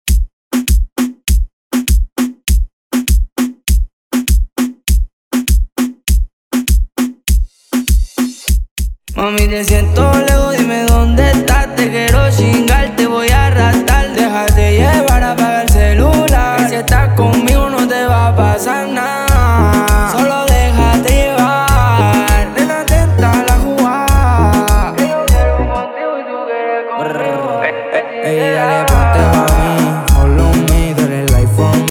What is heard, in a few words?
remix bpm 100